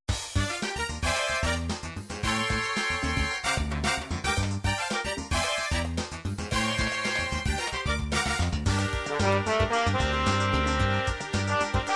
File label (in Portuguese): improviso latino, midi